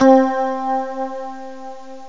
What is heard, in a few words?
chukfuzz; pads n strings; Terra Sound Library; construction set